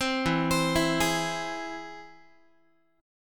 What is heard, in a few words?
E Augmented E down Guitar 4A 4E 5A 5D 5G sharp 6C sharp; arpeggiated